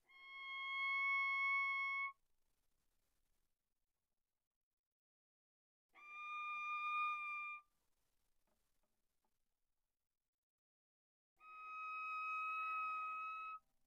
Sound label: Viola arco sul D mf C6 Eb6